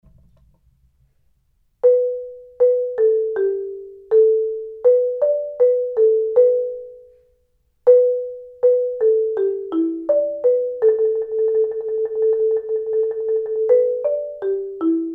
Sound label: mallets